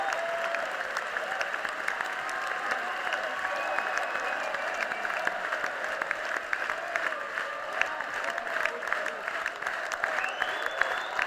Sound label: Applause